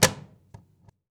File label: CAJON; Univers Sons - Raricussions